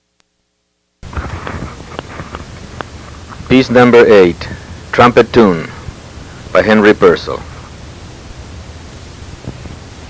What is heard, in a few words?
Trumpet Tune; Henry Purcell; Wedding Music Organ Samples